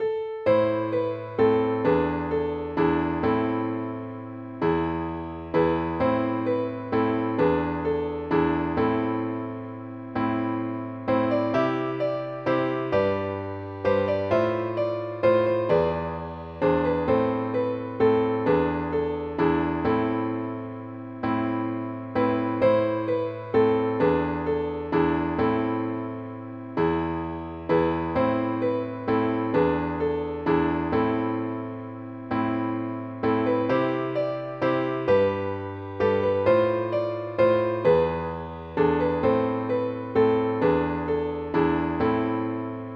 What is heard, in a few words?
ballad